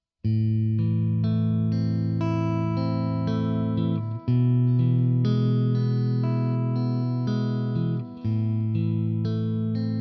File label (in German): Zupfmuster, gitarre